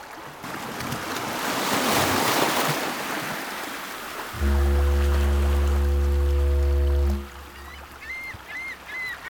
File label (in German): strand nebelhorn moewen